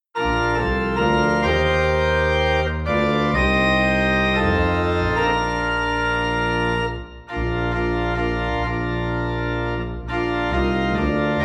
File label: Organ in B Flat